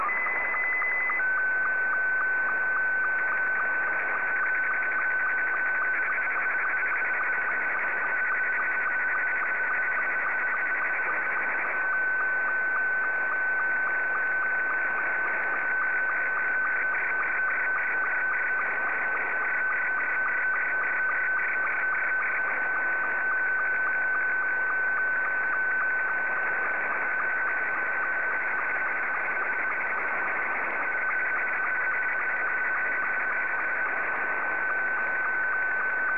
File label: sstv